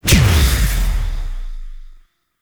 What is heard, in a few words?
Wisp Harness Impact; Warframe